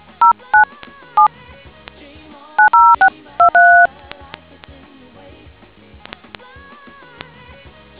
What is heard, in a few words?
dtmf